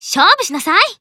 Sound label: Emo Warcry